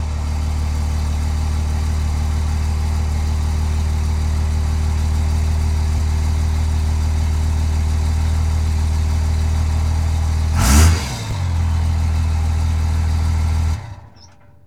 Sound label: Truck Ram Diesel Idle Off; Trucks